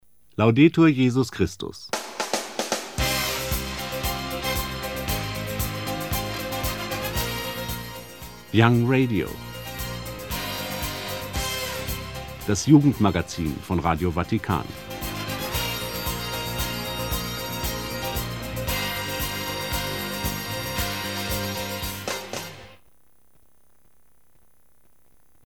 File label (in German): jingle offiziell